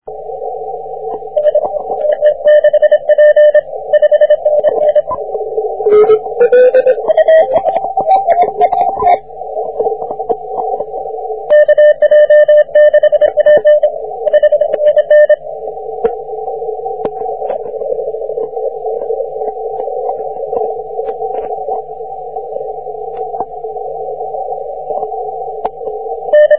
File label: 40m cw